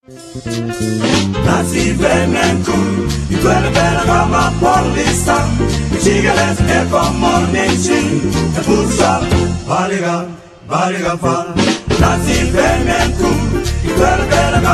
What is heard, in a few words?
swing